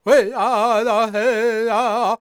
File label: World Voices, Lakota; Spectrasonics Vocal Planet CD5